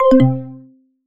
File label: Low Battery; effects; khadas edge2